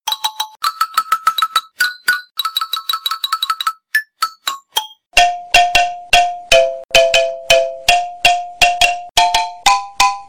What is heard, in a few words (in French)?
xylophone